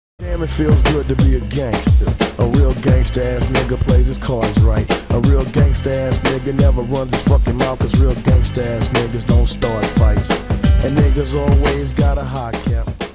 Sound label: Song Ringtone